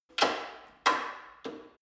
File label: gym bicycle saddle unlock adjust; sport freizeit; fitnessstudio